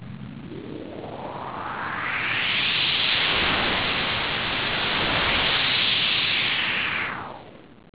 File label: WOOSH; Johnny Ice: Digital Detective